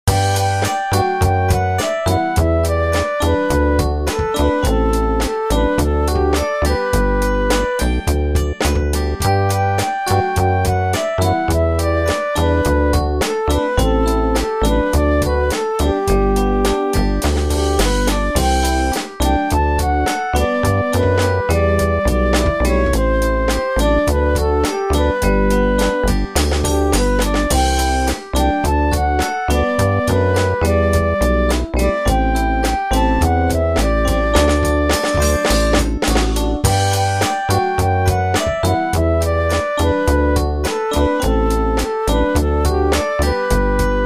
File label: bass